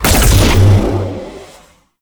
Race Gate Hit; Warframe; Tau; Circles Of Hell

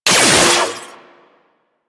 Trip Laser Impact; Warframe; Trapper

